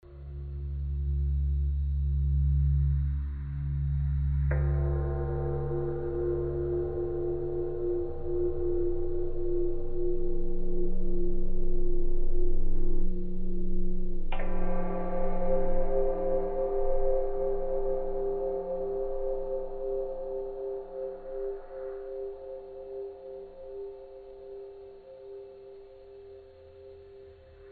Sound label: slow bell